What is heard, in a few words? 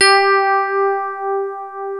TINE PIANO, FM Paired Tines; E-MU Producer Series Vol. 2 – More Studio Essentials; Keyboards